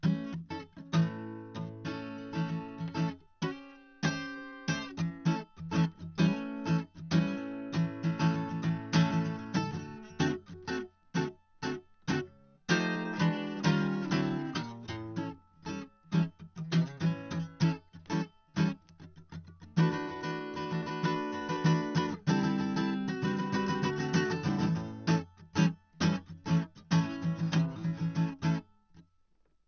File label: Funk 97 C